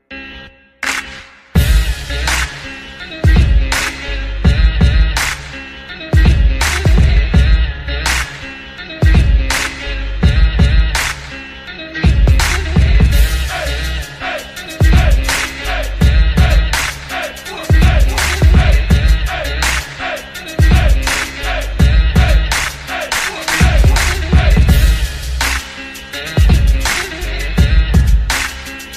trap

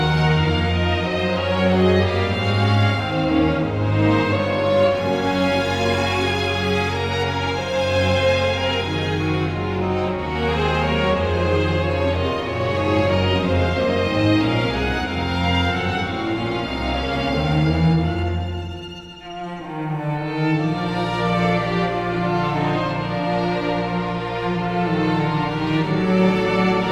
Strings

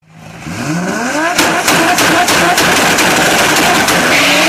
flamethrower; supra

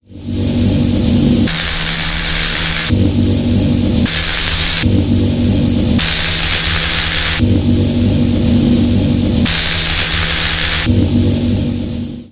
iphone5 ultra high, sms; ringtones

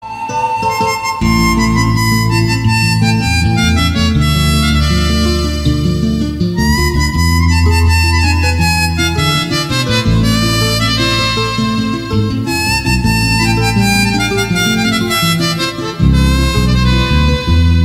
romantic harmonica

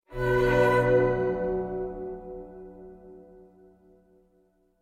Shout; PS3

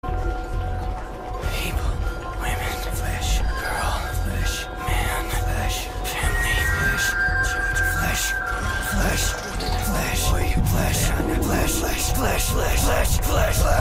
flesh chant

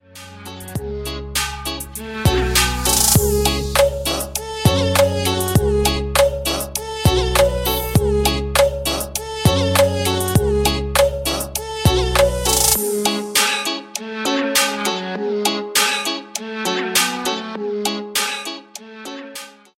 Trap

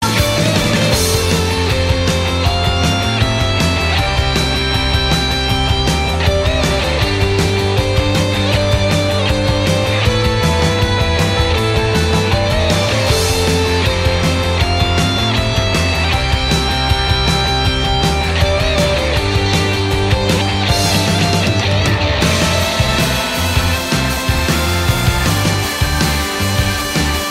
Guitar

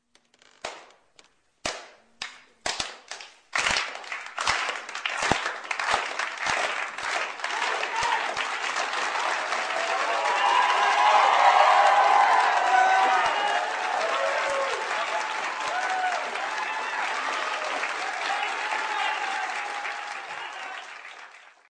the slow clap

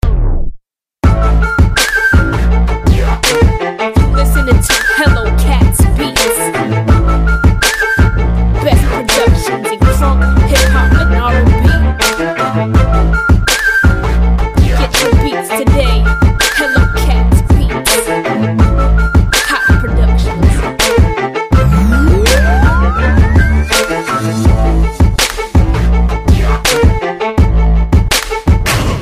supper beat; hip hop

